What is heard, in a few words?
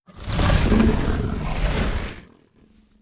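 Hydra voice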